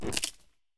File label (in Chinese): 嘎枝冻结声